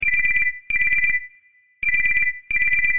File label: Short Ring